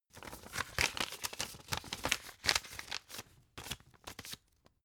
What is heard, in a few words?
old flip page long